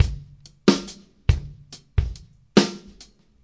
140 bpm, swingy minimal kick snare hat, drum loops and breaks